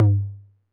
Tom Low 808 Sat, Saturated

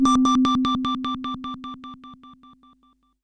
SYNTH Beauty Bell; Bellio

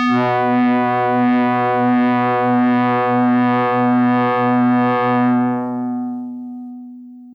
SYNTH Evil metal bazzz hi

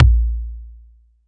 BD

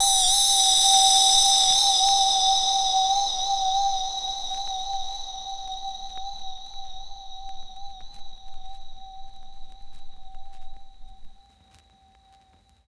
DX100 Glass Lead Verb Vinyl Synths G3; vinyl leads

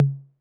Tom Mid 808 Sat

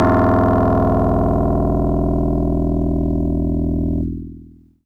Harpsichord DX100 E0

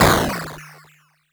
Sine FX

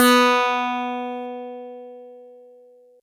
Harpsi FM DX100 B3

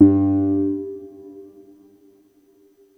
soft tines G1

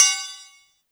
Ride Cymbal

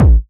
KICK

